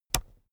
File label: keyboard external space push heavy